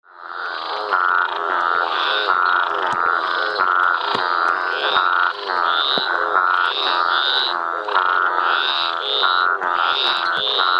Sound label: Physalaemus centralis